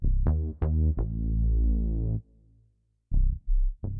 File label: bass; musdb18